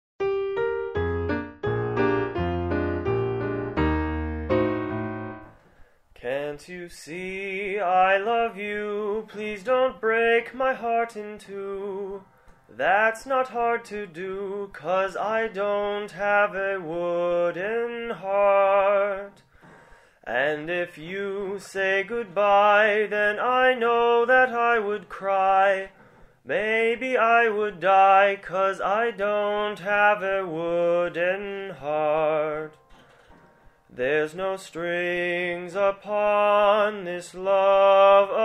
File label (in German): Nur Gesang; Evergreens Und Alte Schlager